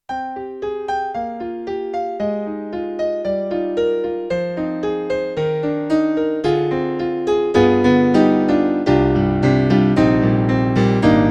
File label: YAMAHA MOTIF-RACK ES, Mono Grand